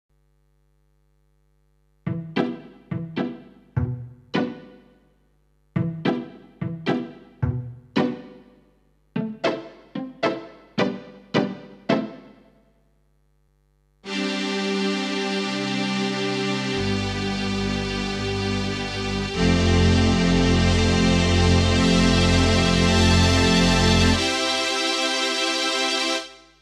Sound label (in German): Streicher Brass